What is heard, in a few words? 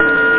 failure